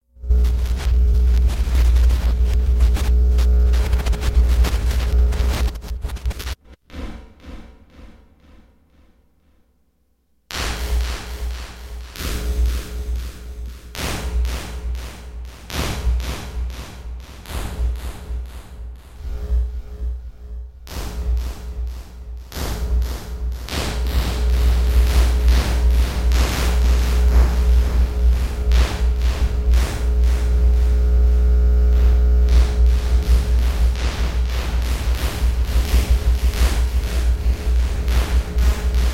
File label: NOISE